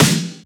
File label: Snare; FLPRO